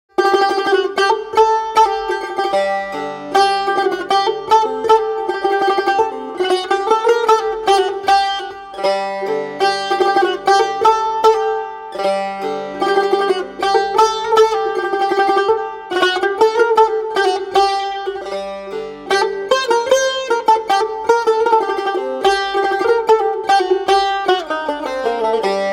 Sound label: Tar